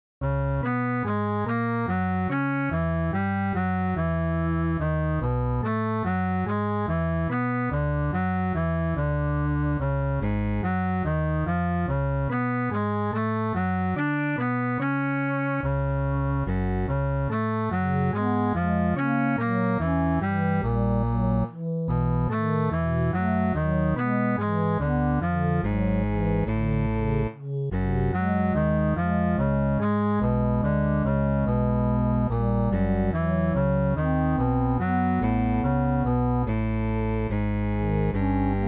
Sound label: MIDI